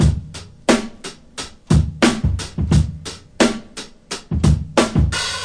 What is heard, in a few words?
88 bpm, drum loops and breaks; deliberate